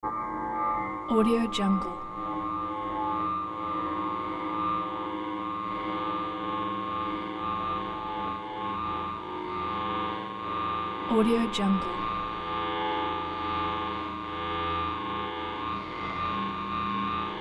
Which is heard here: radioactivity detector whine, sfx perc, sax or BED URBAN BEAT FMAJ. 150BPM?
radioactivity detector whine